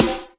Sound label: Claps & Snares